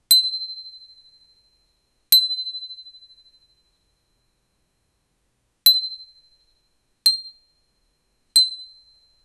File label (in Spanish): ping metalico muy brillan